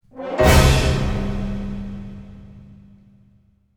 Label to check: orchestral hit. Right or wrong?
Right